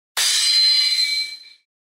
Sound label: crystal; csgo; ze pirates xmas